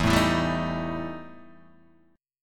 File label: E Minor Major 7th Double Flat 5th, Guitar, Open D